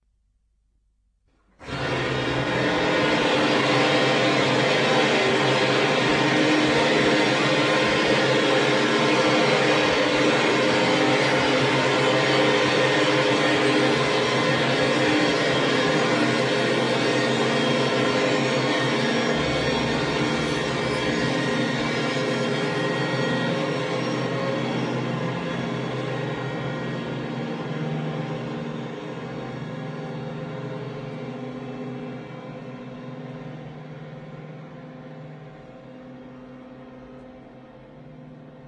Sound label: modem